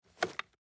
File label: putaway; tacz; daffas arsenal